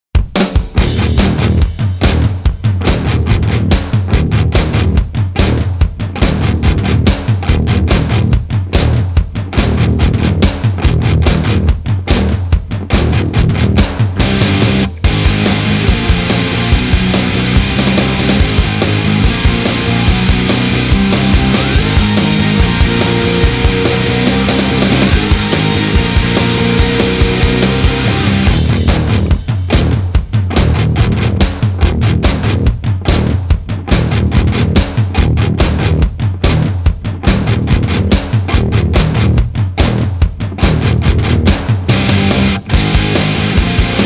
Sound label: instrumental